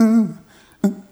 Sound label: Rhythmic A